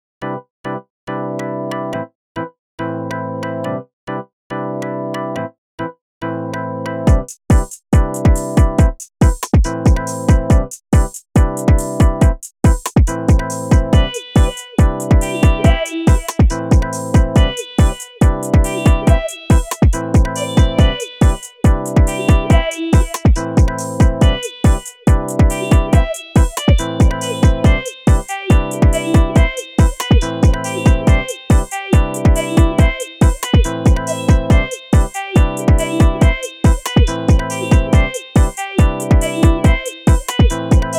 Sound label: Cute Cat, EDM Pop